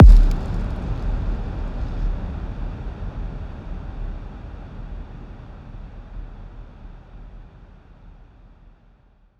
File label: FX Impact Flap